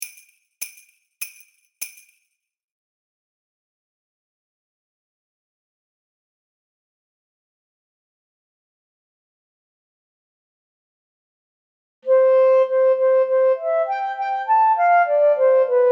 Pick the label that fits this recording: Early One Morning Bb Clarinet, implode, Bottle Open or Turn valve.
Early One Morning Bb Clarinet